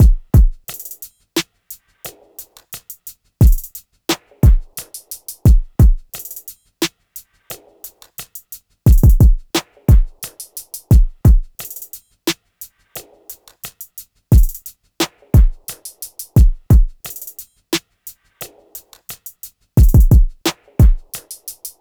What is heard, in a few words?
Drum Loop 88 BPM; Cymatics; Mystery Pack Vol 4